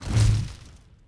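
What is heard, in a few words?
tree slide large; objects; Star Wars Obi-Wan; XBOX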